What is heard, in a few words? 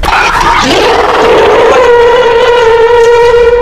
devil roar